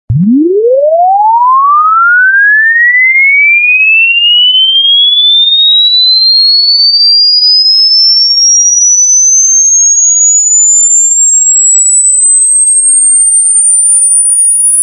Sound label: ultra sound sms ringtone